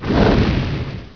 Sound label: plasma; Pro Android Games; Wolf3D